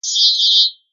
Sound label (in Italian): ciottoli zeitverz; acque